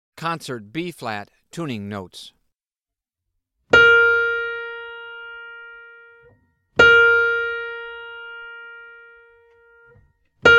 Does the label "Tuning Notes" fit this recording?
Yes